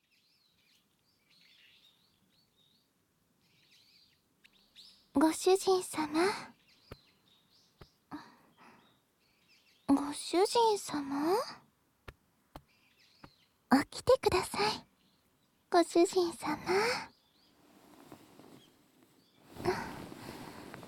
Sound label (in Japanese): 耳舐め甘やかしローション手コキ; アメリ